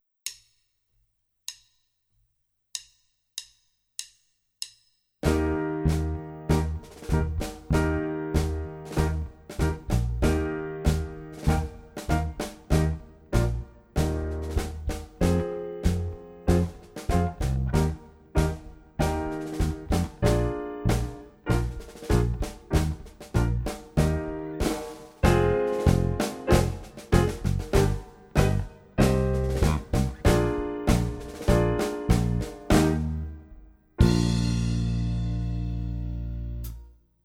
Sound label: Tango staccato - play along